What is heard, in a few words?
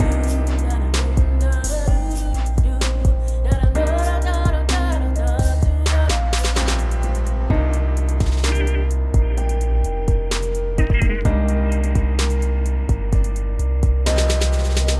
MIX TECHNIQUE REVERB REPLIKA ON